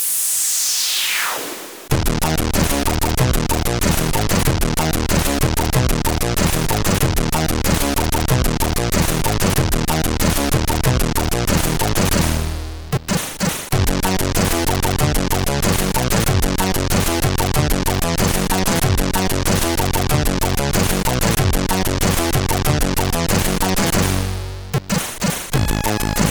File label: Techno, CSG8580R5; hvsc